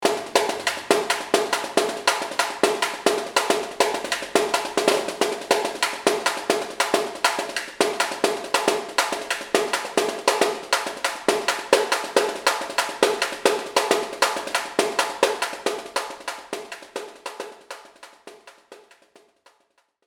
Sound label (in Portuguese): Caixa